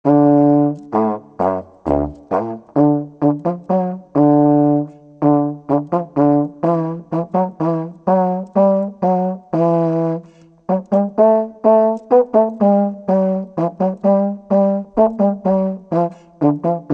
Serpent solo

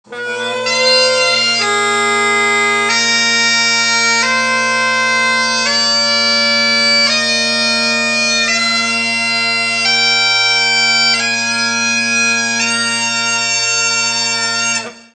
Bagpipe

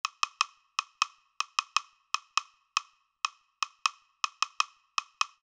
woodblock solo

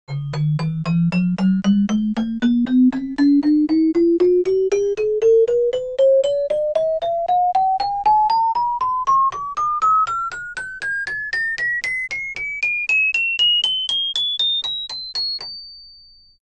celesta

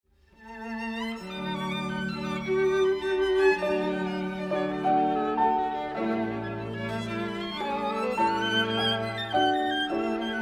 violin chamb

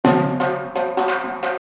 Naqqara

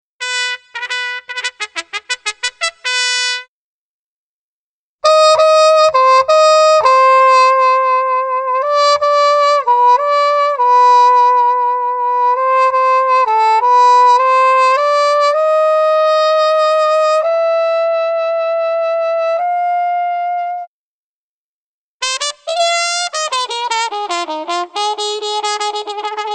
trump sourd

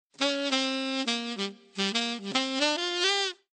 tenor sax

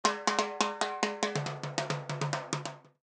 timbales

